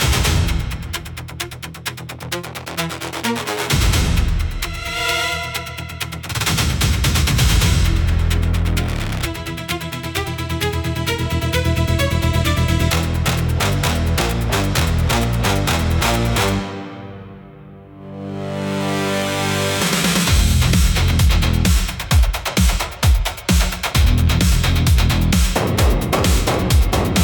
Burning Rubber Run, high tension suspense

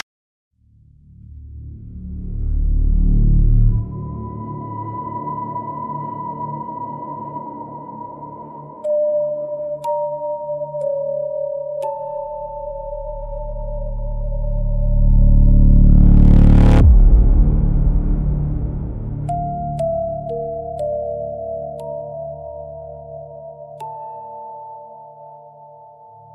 spiritual spooky soundscapes

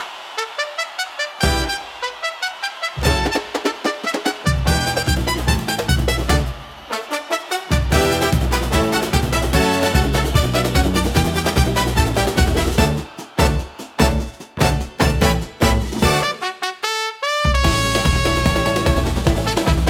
Carnival Thunder, upbeat carnival; for festivals and parades

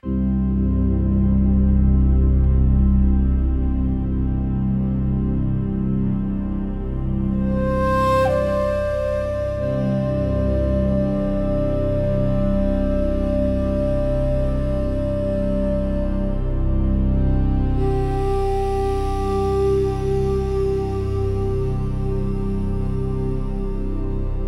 quiet japanese ambient

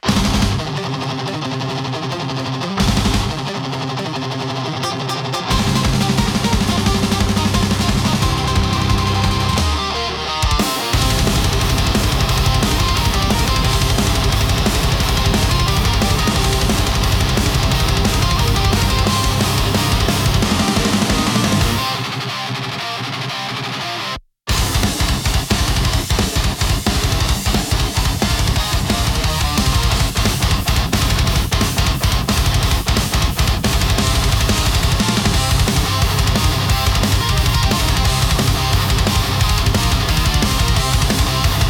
intense japanese rock

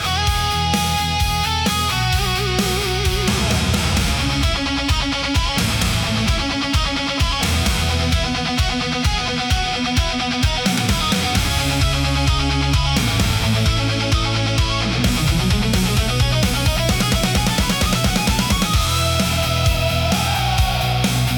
hard rock for powerful

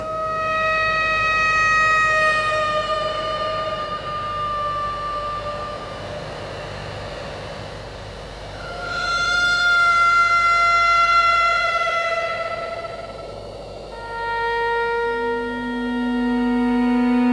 Glass Hollow, spiritual spooky soundscapes

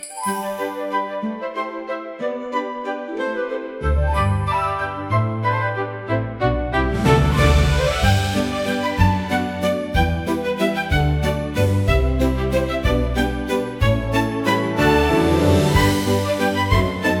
fantasy music cute bright

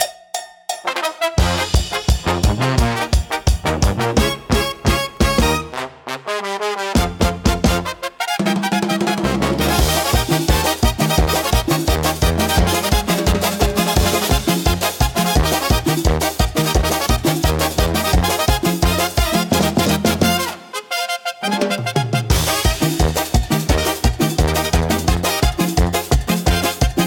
Midnight Brass Parade, upbeat carnival; for festivals and parades

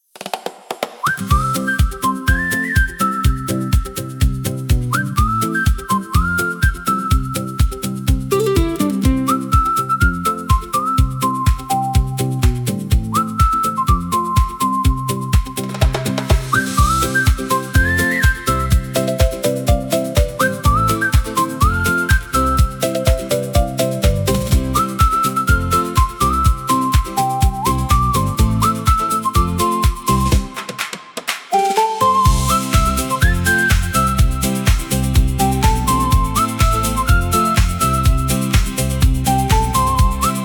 Sunny Steps, hand wraps whistles

